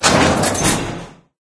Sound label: slider gate open; ambient; source engine; ep2; outland